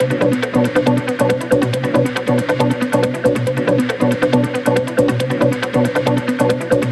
Special Sounds Root B 138 BPM; vengeance essential club sounds-3

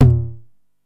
TOM L, 909; musicradar-synth-samples; Classic drum machines; 909TOMS

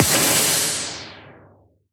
Impacts

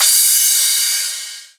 Crash, Cymbals; Vengeance Samples

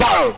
303 Acid E; Vengeance Samples

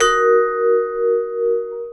vb d#, vibes; house; PS-28 Vocal House Samples

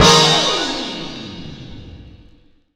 hit d#; house; PS-28 Vocal House Samples; hits

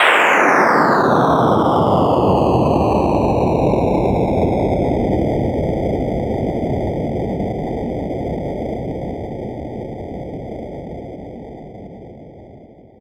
FX Sweep Down; vengeance essential club sounds-3

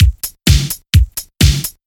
Housebeats, 128BPM; Vengeance Samples; VEH2 Loops

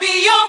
Vocal Phrases; Vengeance Samples; Vocals

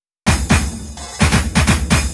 Fill 128 BPM; Vengeance Samples; VEH1 Loops

